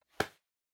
Hand Foley Pat Gentle

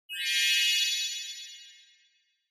Multi Accent Short

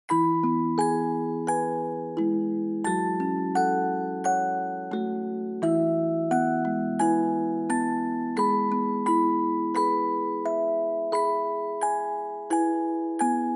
Reverb Slow

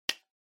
Stopwatch Digital Grab

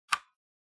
PC Hardware Power Jack Plug In

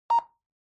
Handheld Transceiver Signal, Tx Beep

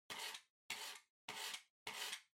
PC-Mouse-Move (Far-Hit)

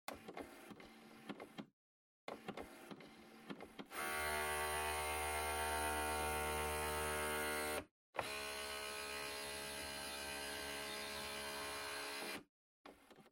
Printer Scan

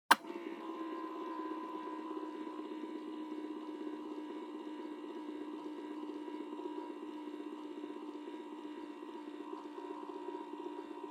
Music Box-Foley (Drive)

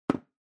Music Box Foley Close

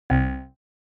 OS Sound Notification

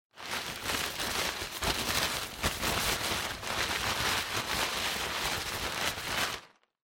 Packing Paper Rustle; 梱包紙; ガサガサ; 梱包材

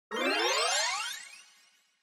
Scene Change Up Stereo Pan

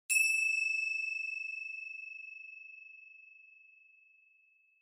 Finger Cymbals Dry Long

